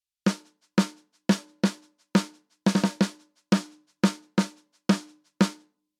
snare off

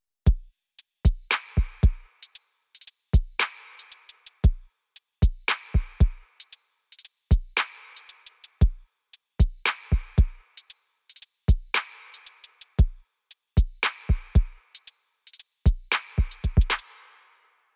drum off

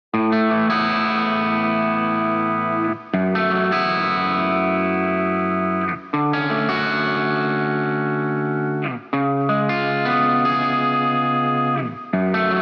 guitar off

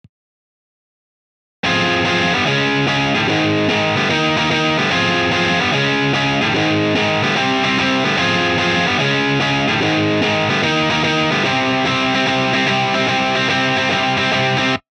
boost On

